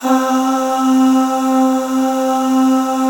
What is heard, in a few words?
C3 MALE AH; Northstar - New Gold VOL-2